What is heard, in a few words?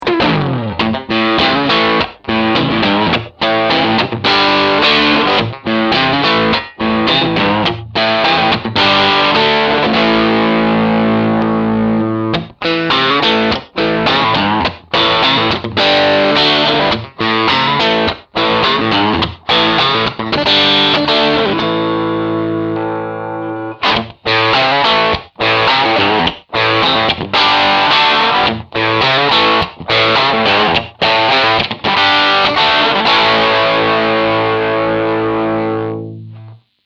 Coil Split Crunch 3 Positions